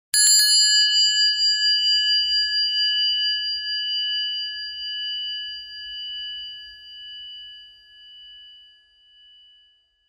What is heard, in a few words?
bell